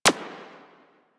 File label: Decoy Counter Measure Shot